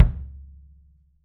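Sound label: wood kick, left; 3D Drums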